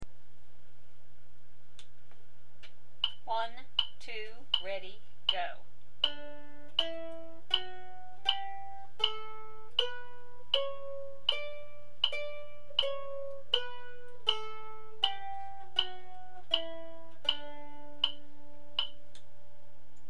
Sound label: D Scale 80bpm, mandolin